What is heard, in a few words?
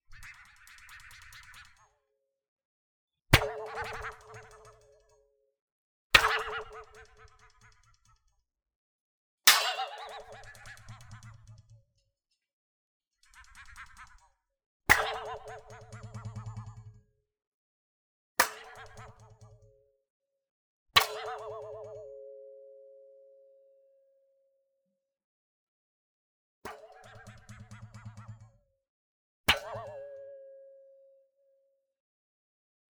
Cartoon Twang Sound